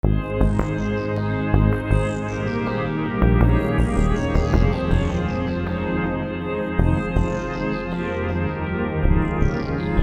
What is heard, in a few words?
Ambience Version